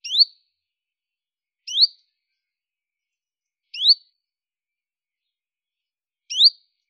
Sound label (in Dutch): tjiftjaf